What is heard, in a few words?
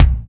rich high end bass drum single shot a sharp key